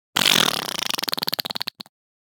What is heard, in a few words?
Fart Foley The Great Finale; Hollywood Edge; sound designer's toolkit v2